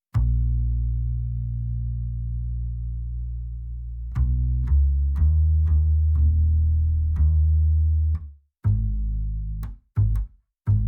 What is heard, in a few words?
Acoustic Bass PreEQ